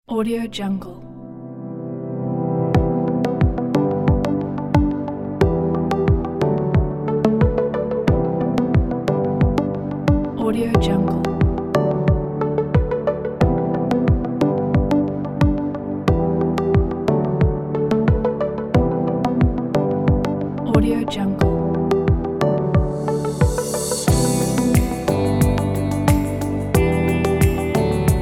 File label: minimal